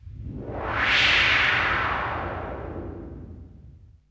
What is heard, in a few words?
Whoosh; BERZARRESOUNDZ